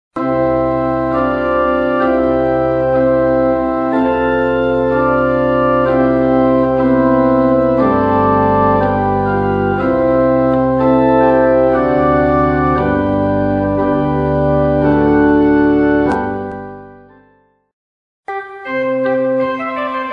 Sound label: Trumpet Vol in C-Dur; Travers